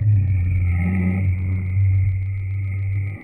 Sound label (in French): grognement de bête